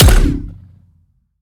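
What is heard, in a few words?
Sci weapon Shot Medium Gun Warp Sniper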